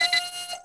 Beep

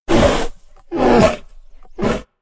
Black Bear Vocal; Animals; XBOX; BassProShopsTrophyHunter2007

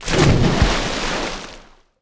shark splash in; Tony Hawk's Project 8; XBOX

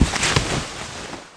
hit can flag; hits; Tony Hawk's Project 8; XBOX

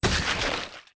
disembowel, gore; Soldier Of Fortune II Double Helix; XBOX

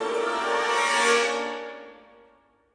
victory, music

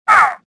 choke, worker; worker2

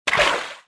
water walk, footsteps

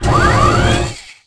mech arm; Tony Hawk's Project 8; XBOX

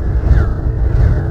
huey off; ambience; Soldier Of Fortune II Double Helix; XBOX

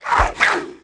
Mutant Pod Lash Out; Valleakan Mutant 2; Aliens

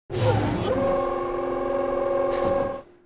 comm Console activate; machines mechanical

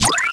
reject; interface

